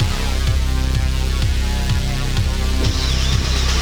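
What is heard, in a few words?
music fight legacy intro; SND MUS Subway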